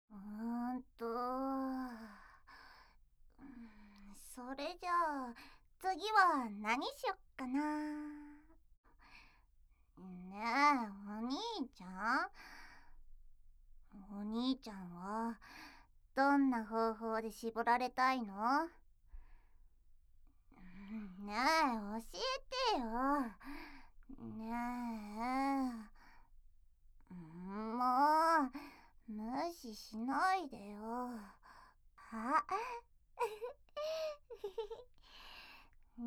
耳舐め地獄, 効果音無し; 地獄巡り; ASMR

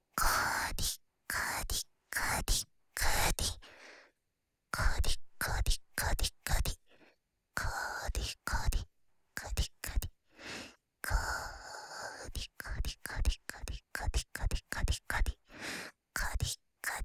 乳首責め（かりかり・右）（SEあり）乳头刺激

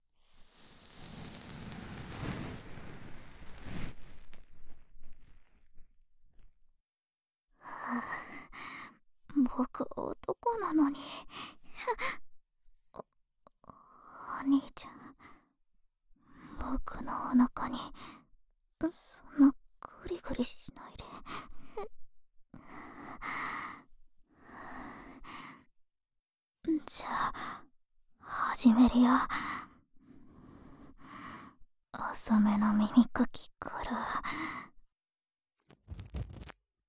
右耳かきパート

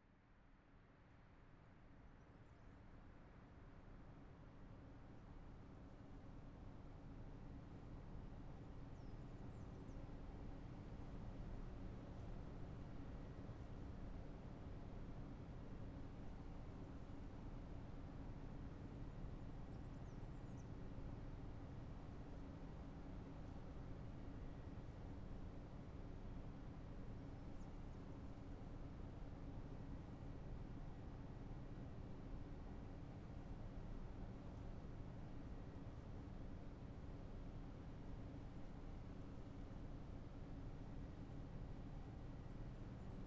耳もみと耳かきと（左の耳もみ、耳穴指入れ、耳かき) ASMR; あやかし郷愁譚 ～あかしゃぐま すみ～

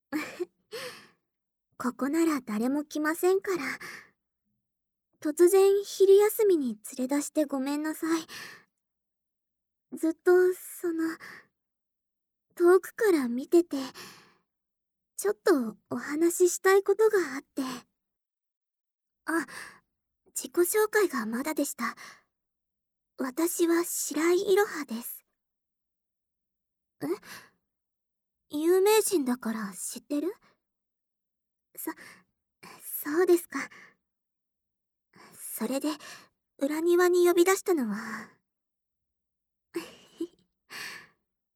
突然の告白と耳舐めシコシコ(効果音カット)

